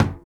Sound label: Conga; Equipped Music; Loungin House